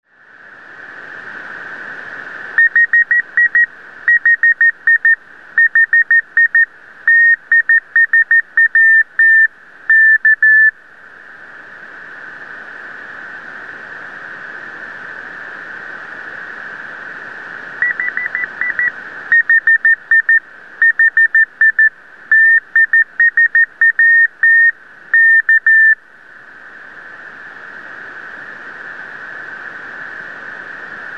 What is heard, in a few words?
TIsat-1 CW Beacon; TIsat-1 HB9DE; ground station of SUPSI Radio Club, HB9SRC in Manno, Ticino, Switz